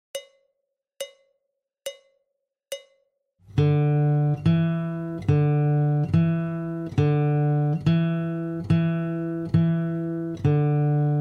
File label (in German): WarmUp E mittel